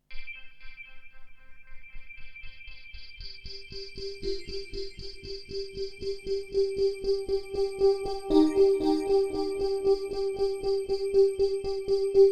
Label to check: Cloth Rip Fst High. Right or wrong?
Wrong